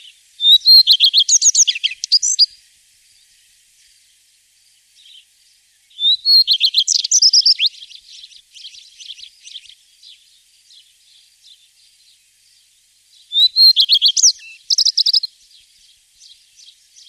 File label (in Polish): pleszka i wróble; wroblowe; turdidae